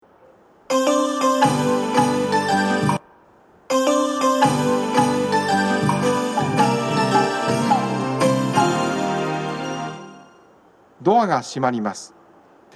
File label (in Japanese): 発車サイン音; 2番線 中野・三鷹方面; 木場